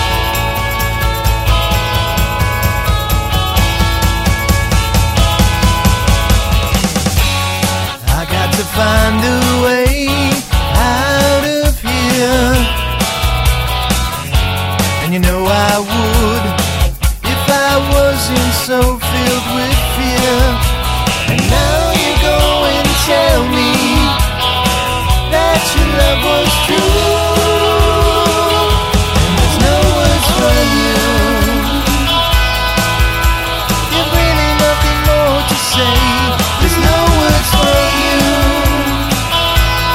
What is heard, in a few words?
no words